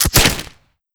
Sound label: target practice shoot